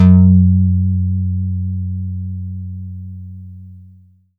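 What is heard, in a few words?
synth Harplike; Best Service Gigapack 1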